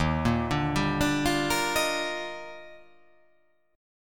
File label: D sharp Major 9th